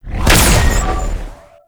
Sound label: Laser Wall Start; Enemies; Weapons; Warframe; Tau; Sentient Dactolyst